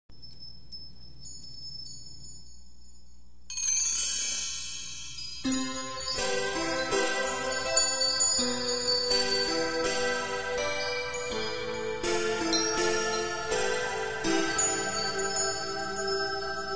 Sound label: score sample; Voices from the High School